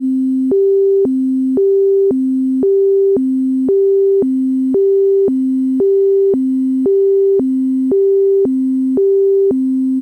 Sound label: gps clock alarm